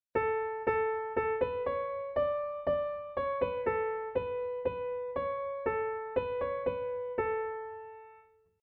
Choral Melody

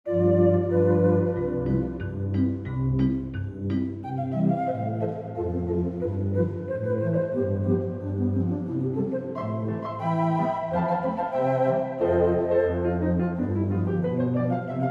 Theatre Organ

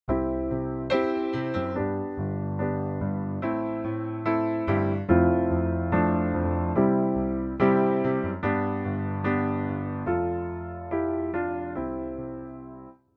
Steingraeber

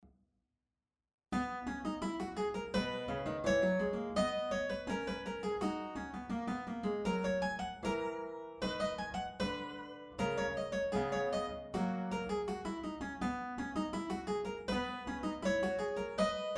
Clavichord; LeCouppey O22 N22

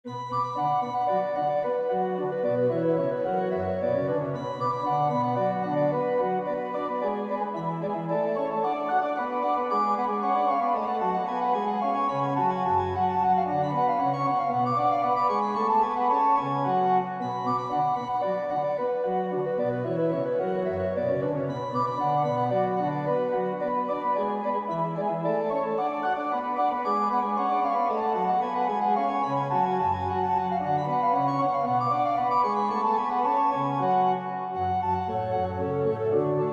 Badinerie Organteq

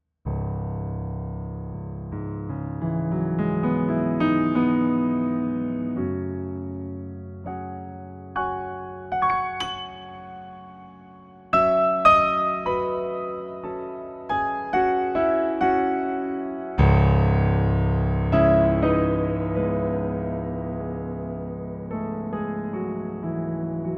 Piano

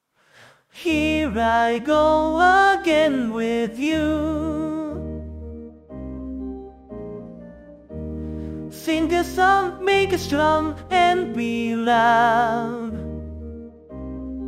romantic organ loud; OrganTeq B-Loud

